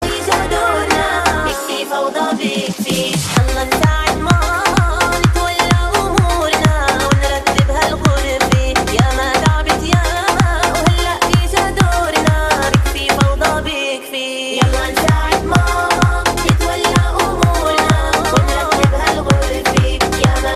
arabian ringtone

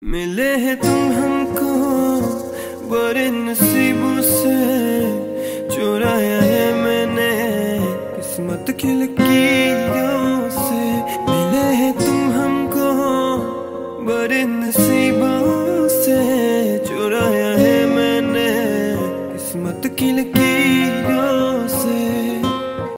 bollywood medley ringtone

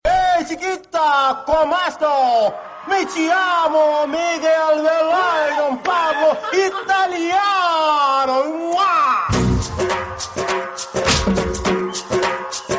italian music tune ringtone

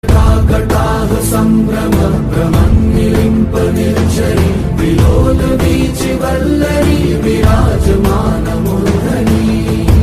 sanskrit shlok emotional ringtone

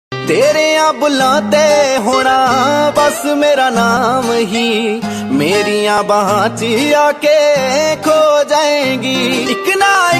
punjabi ringtone

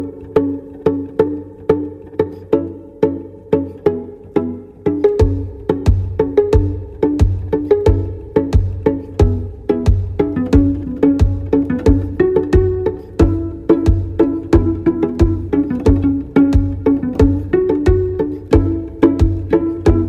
violin cello cover ringtone

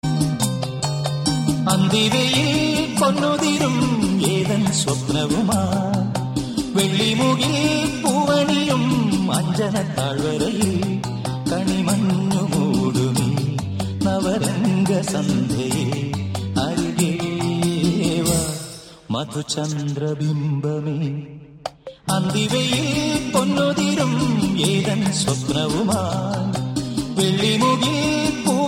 malayalam tune ringtone

